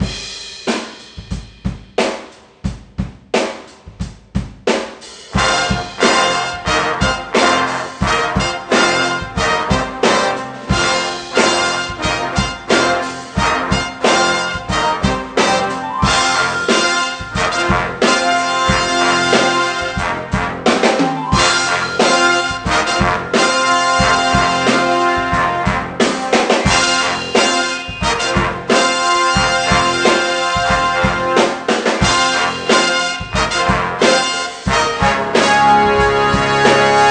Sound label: Menu Song; NCAA March Madness 98; PS1